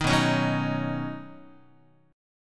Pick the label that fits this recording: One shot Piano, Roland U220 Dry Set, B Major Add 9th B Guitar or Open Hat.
B Major Add 9th B Guitar